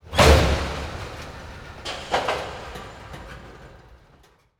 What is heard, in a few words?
train take up; ambient